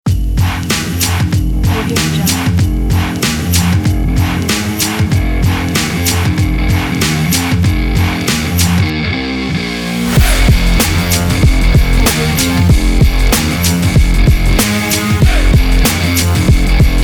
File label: sport hip hop big beat kit